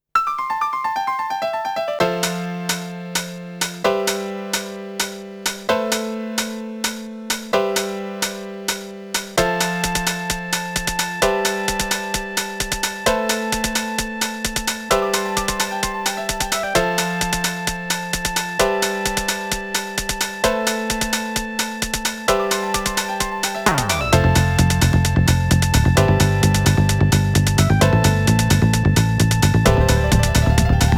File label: Staff Roll; NO PAIN